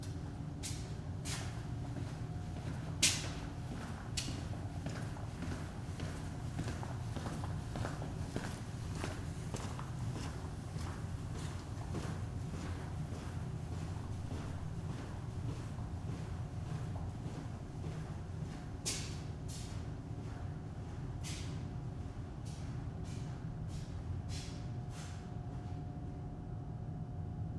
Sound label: Walk Basement